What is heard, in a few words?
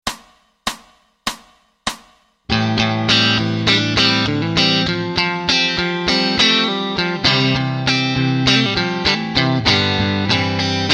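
Rhythm Guitar Open Embellishment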